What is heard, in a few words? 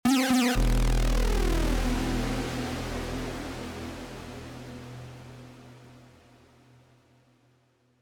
huge lead; monosounds